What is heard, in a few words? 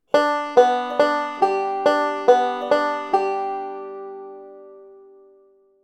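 alternating middle finger roll, banjo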